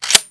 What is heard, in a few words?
slide forward, weapons, glock18; garrysmod